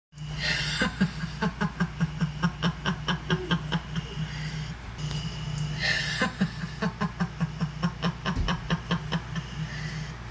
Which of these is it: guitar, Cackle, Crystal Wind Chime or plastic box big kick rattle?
Cackle